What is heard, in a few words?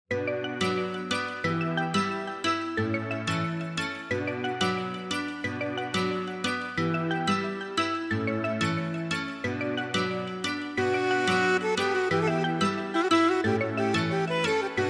Dancehall Remix English Ringtone